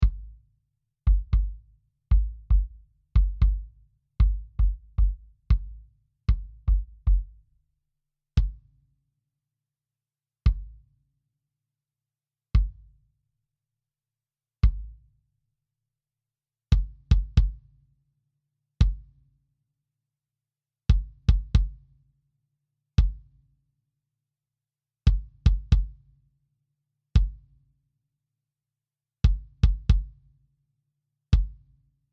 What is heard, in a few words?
kick dry